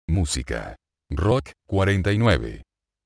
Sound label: rock